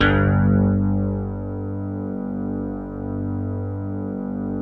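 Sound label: rockin bass; Greytsounds; Scott Peers Sound Engineering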